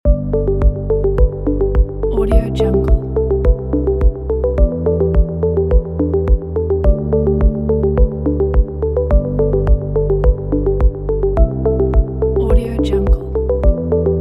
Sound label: corporate tech